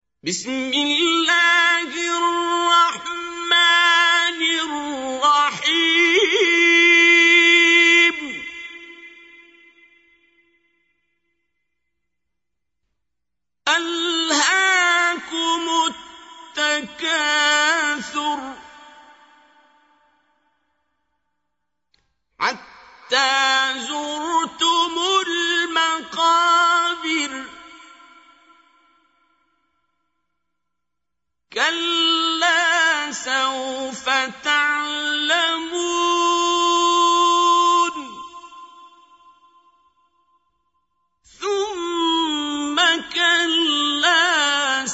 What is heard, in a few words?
Recite, qari quran; Surah Al Tkashur; Qari Abdul Basit